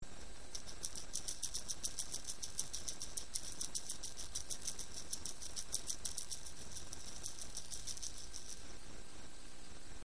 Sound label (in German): minischelle blech